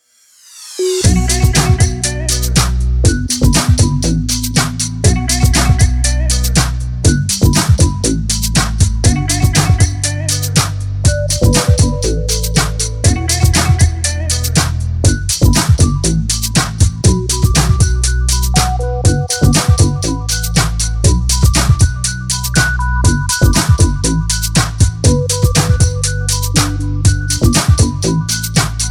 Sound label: Hold Music